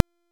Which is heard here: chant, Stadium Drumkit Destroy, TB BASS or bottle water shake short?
Stadium Drumkit Destroy